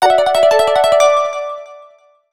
Alert; PlusFourSix; Patti; Lars Edvall

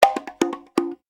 drum, notifications; ZTE nubia N1

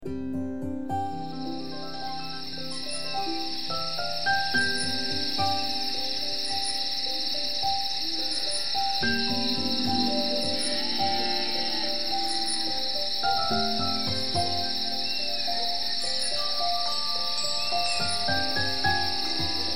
Newage Mix; SKY IM-U310

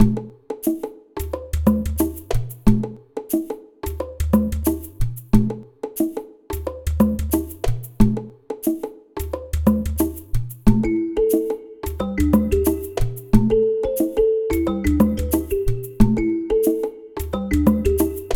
Tropical Rhythm, ringtones; Samsung; Galaxy A56

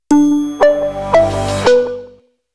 Power On; Samsung; Galaxy Express 3 SM-J120A

